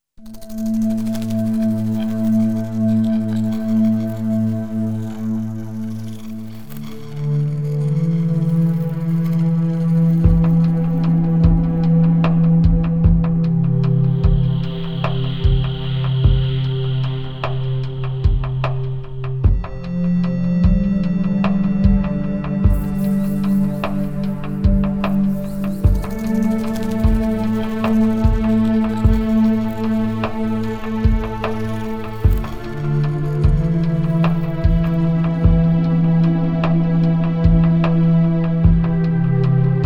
something strange version courte

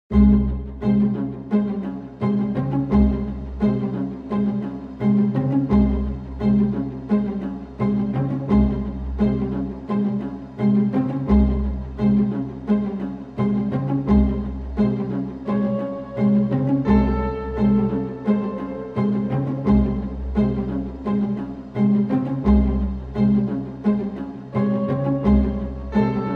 air flow tension; peur

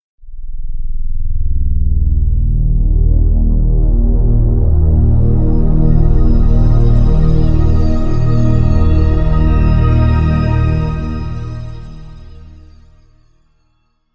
nap jingle

